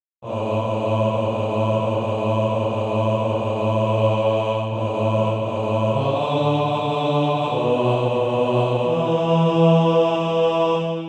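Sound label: bass, Practise Music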